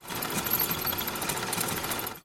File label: sewing machine